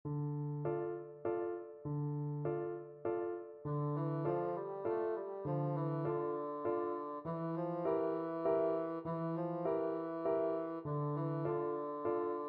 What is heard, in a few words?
creak crunch scream, bassoon